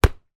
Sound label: sport rugby ball kick; zapsplat